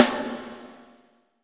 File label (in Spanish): CAJA, percussion reverb; MARKYDRUMS